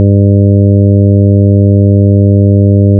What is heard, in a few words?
real tympani